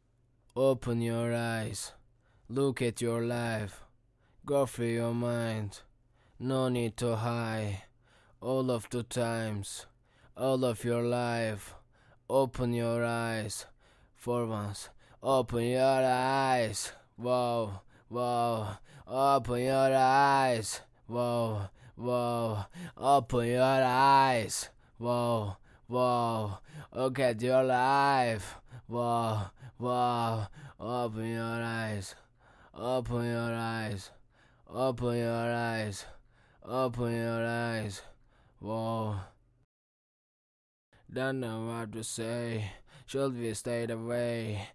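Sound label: hip hop, 124, B flat major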